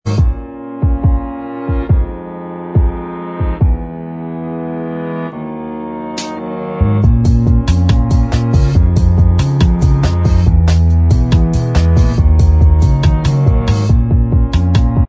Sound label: Inst